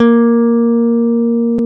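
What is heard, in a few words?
Bass Electric; CMI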